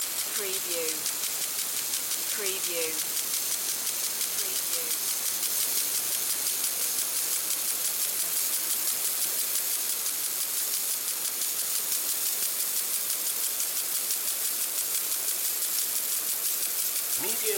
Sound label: Sprinkler Operate